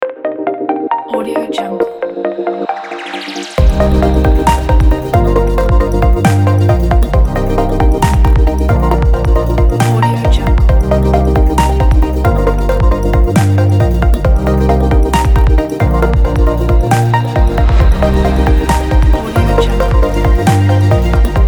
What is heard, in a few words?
future bass; red-ninja